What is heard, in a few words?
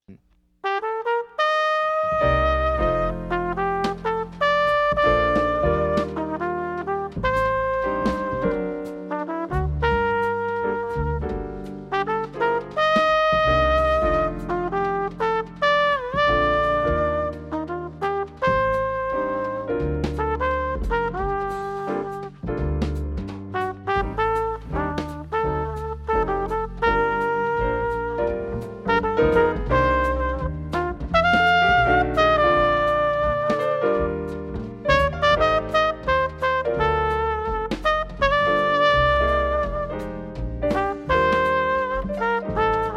flugel